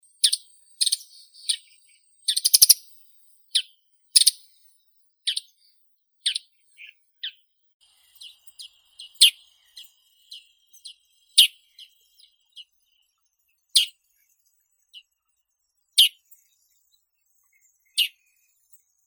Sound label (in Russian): sorokoput yaponskii lanius bucephalus, zvuki prirodi